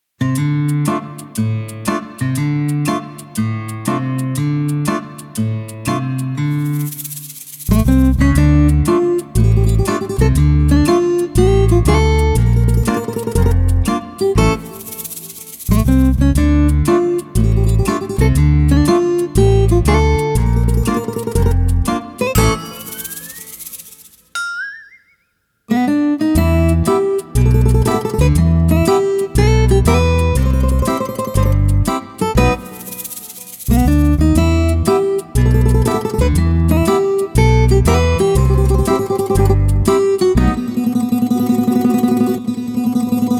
Synth Wave; Comic 드라마

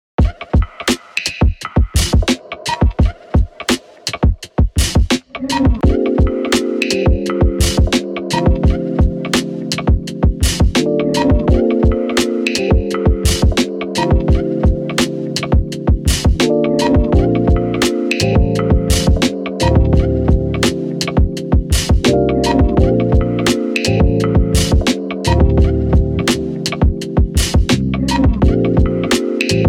Dreamy Beat, Hiphop

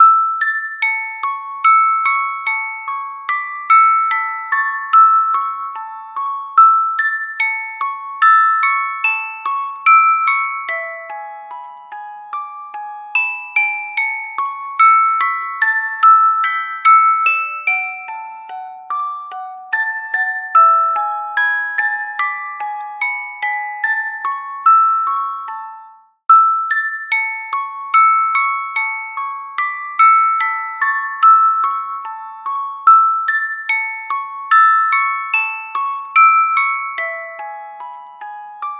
Red River Valley Orgel; KIDS 귀여운

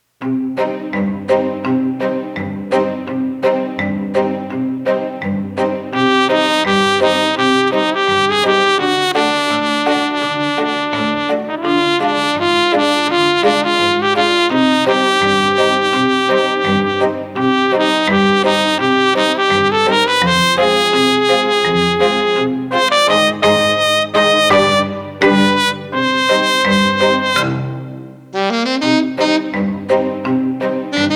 Cute Monster Trumpet Ver; Comic 드라마

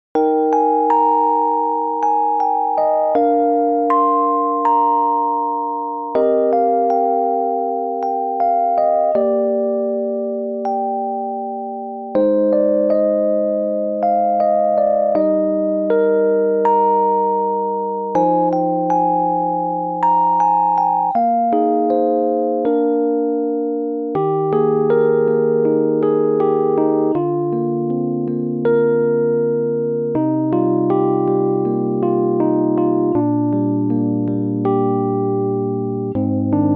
Newage, 감성

